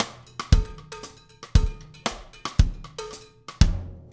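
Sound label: cowbell, drumscores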